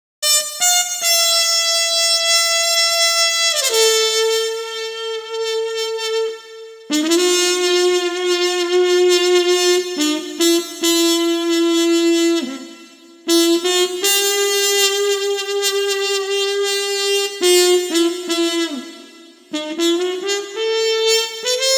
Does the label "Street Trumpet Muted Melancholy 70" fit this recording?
Yes